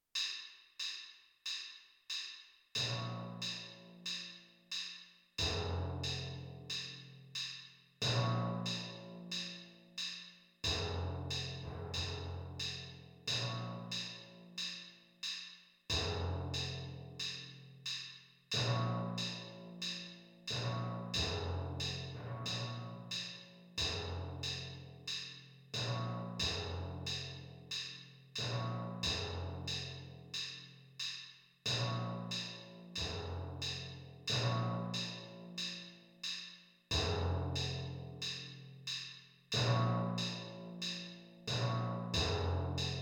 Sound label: timpani